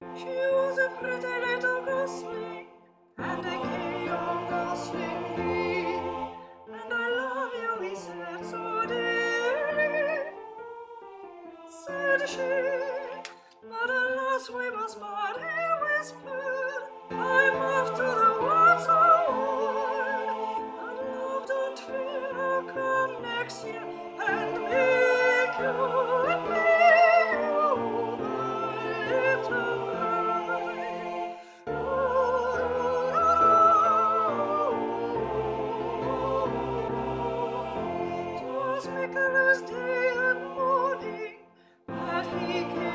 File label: TENOR